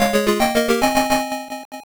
Test Menu; Mezase Pro Yakyuu Pico gamerip 1995

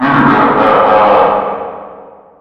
monster laugh

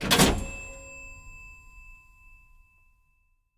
Phone Slam; CS Breach

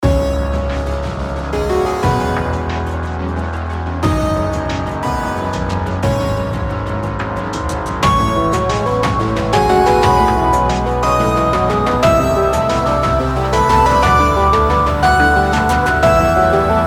bgm Win Theme; Robo's World: The Zarnok Fortress

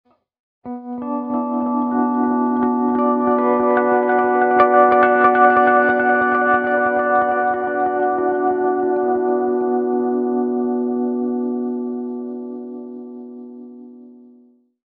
G steel

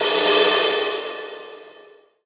Hi Crash

